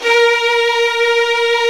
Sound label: STR SW VLN, Vlns 8 fff; String Master Series